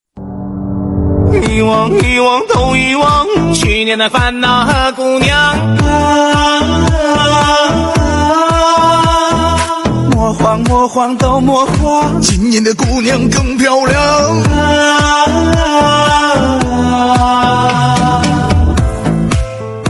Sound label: chinese song